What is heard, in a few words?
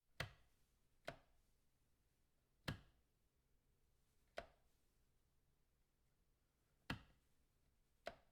wall light switch far